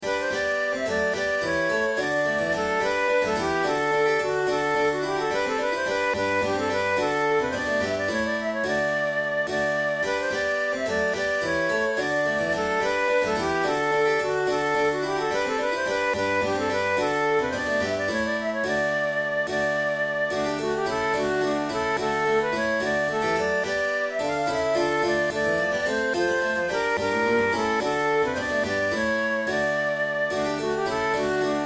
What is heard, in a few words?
courante, flute; praetorius; terpsichore no28